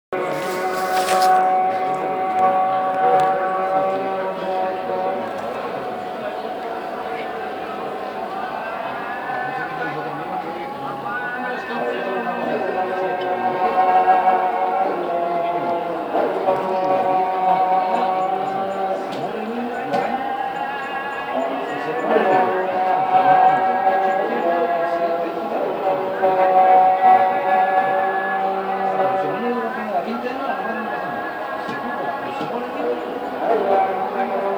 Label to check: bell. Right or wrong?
Wrong